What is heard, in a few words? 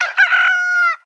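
sound of chicken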